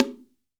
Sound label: BONGO, STEREO BONGO